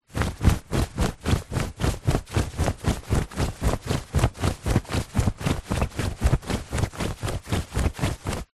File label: clothes shorts run movements